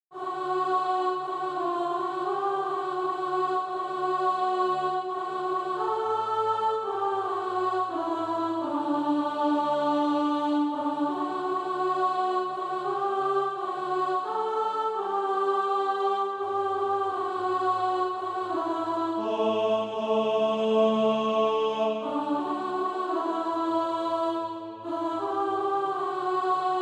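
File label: alto, Practise Music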